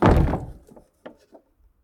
push; pirates

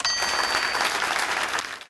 draw Playable; crazyeights

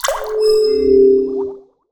heal; battle

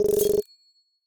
right of way; milebymile

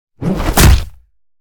body slam; battle

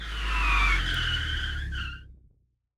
stop; milebymile

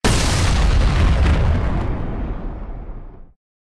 seismic blast; battle

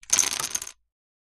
shuffle, dominos

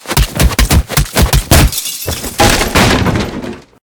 brawl; battle

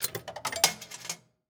tax; coup

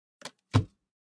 discard; cards

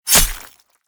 quick slash; battle

